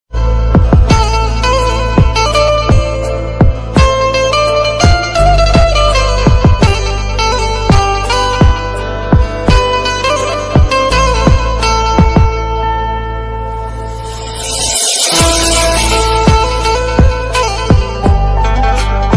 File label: benjo love ringtone benjo instrumental music gujarati instrumental ringtone